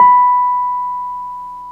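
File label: Wurlitzer electric piano B5; Keyboards of The 60's and 70's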